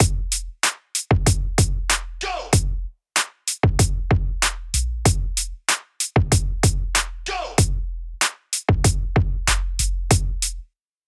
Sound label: DJ Debut Beat Juggling Pattern